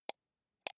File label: computer mouse wheel click slow soft